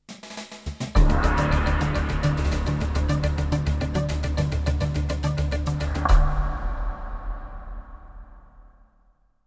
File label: Jingles; 90 DDD Jingles